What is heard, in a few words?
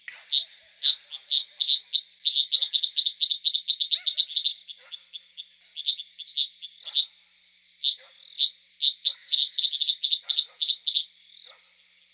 Hylidae Dendropsophus microcephalus